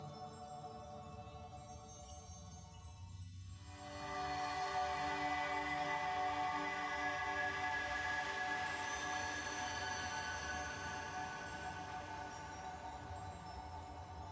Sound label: amb